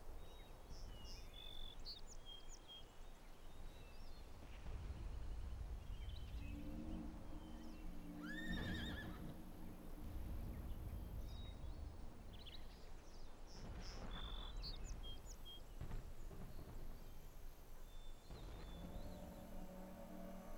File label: farm; WAR